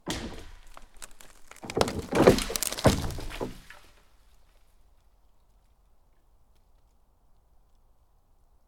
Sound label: Pine Tree Falling